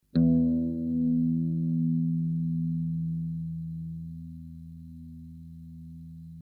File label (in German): E tief, guitar tuner